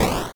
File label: Cowboy Dead; Player Dead (Second Variation); JotPK